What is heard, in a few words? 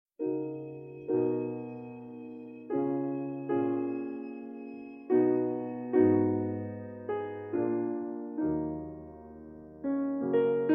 violino sonata